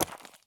walk slate; Escape from Tarkov

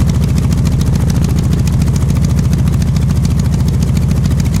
wildcat rear, engines; War Thunder

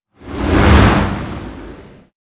heli whoosh stereo; oilrig; Call of Duty Modern Warfare

